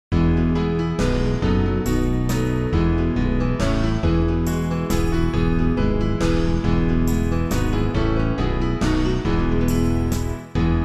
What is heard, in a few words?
backing; New Hope Music